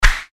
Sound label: Fighting Swing Sound; FXHome